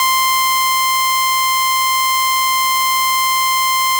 absynth c5 saw; 混淆现象，高频里夹杂着噪音; Absynth C5的锯齿波

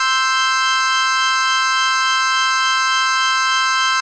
modular c5 e5 g5 saw; 温柔的和声; Moog Modular V C5、G5、E5的锯齿波和声

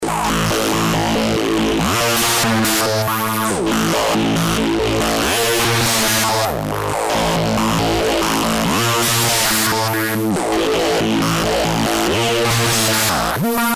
bass, Korg RADIAS; 贝司; 所有声音均由RADIAS发出，只用了一个音色（无音色叠加）; 合成器

